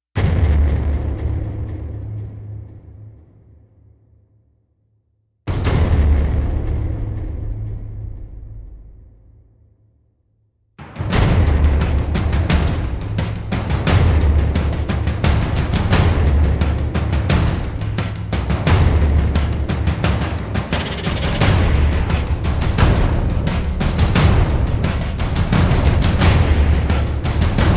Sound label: Drums Only